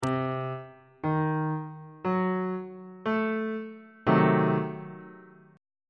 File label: d sharp diminished 7th 3rd inversion on bass clef